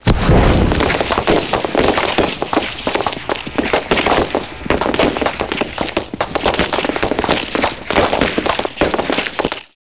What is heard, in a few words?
decoy; arccw go